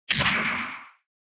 Retro Anime Accent Short

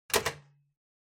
Ceiling Light Pull

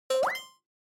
Single Accent High

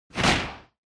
Motion Slam

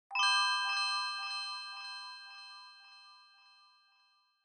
Bell Accent, Low Delay

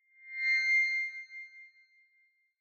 Megaphone Feedback Reverb

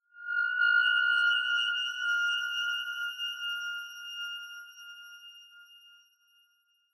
Horror Accent, Long

